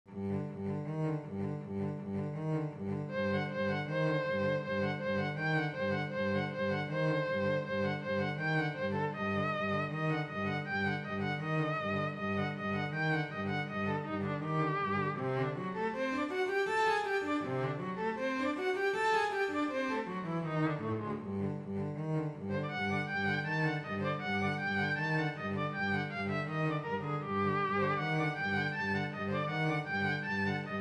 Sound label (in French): duo pour violon violoncelle; vignon denys